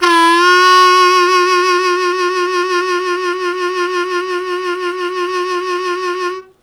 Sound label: Strong Vib w Scoop F#1, Harmonica; Zero G